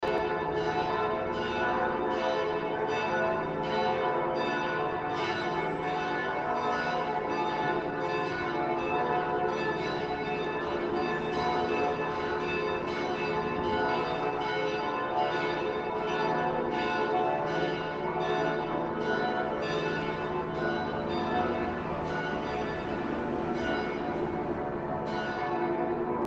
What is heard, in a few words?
bells